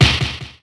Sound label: punch